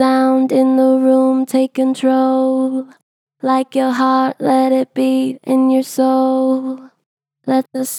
Gentle Flat, Sound in the room take control, Vocals; Harmor Vocals